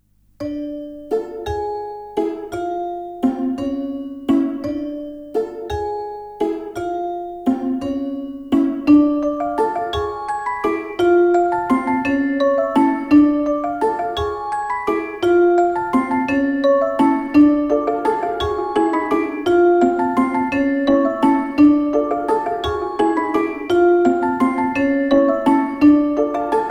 Sneaky Suspicions Underscore, Dramedy, orchestral comic drama; mischievous orchestral comic drama; Narrative Mind